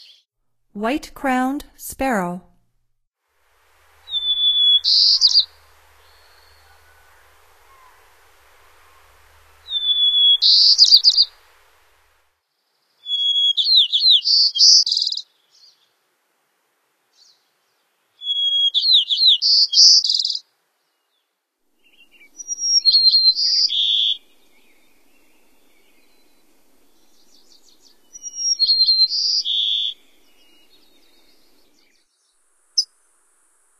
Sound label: White-crowned Sparrow, Songs of Birds of North America; Stokes